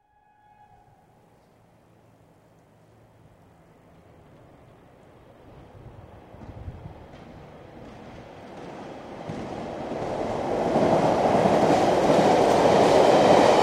Electric Train, exterior, pass through station from left to right (Class 317); Electric Train, exterior, pass through station from left to right (Class 317, built 1983); BBC